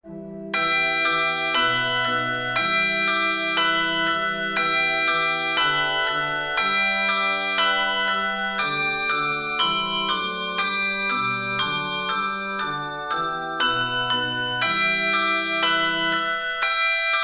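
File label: handbell descant playback; Southwell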